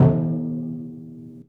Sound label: Tympany; Misc Percussion